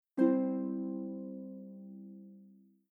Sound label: Wake Alert